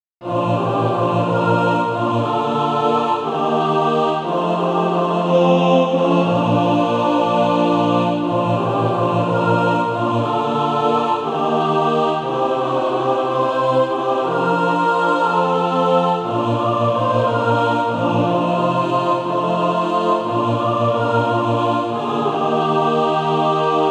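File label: mixed, Practise Music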